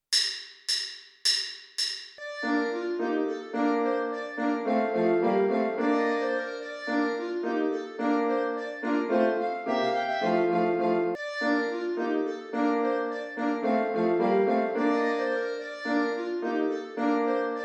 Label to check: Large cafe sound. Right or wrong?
Wrong